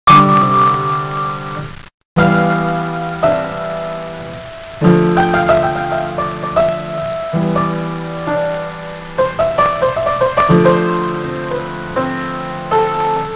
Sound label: future music piano